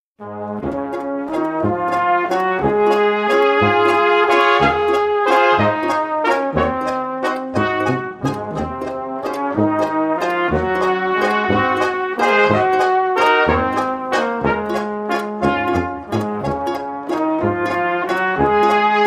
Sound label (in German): walzer